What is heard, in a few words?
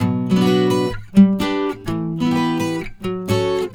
Acoustic Latin Guitar 128BPM Bmin; Vengeance - Essential Clubsounds Vol.4; Guitarloops